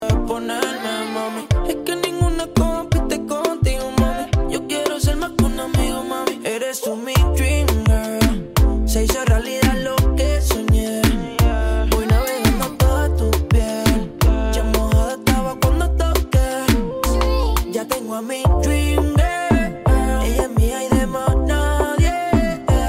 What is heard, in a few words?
remix